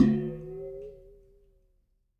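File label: water pan; Spectrasonics; Liquid Grooves; POTS + PANS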